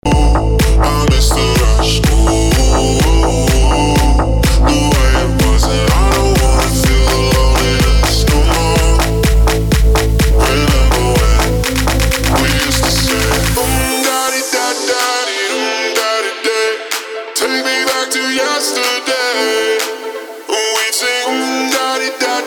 Club Party Banger

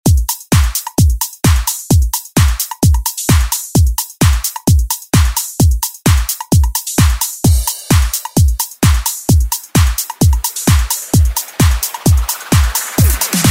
Dance Redrum; Deejay Hit

